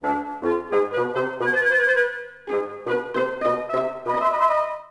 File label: Expectant